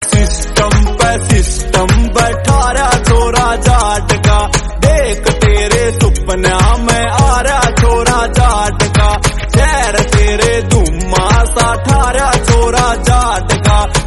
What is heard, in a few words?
Haryanvi Song Ringtone